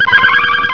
tilt

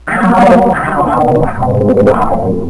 leadin

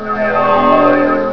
space buzz

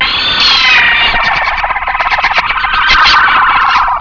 invasion

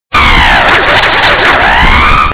phaser

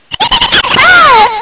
ooh

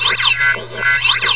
whippy

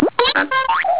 doink

